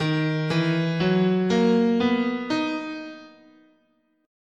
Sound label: Eb Balinese Pelog, scale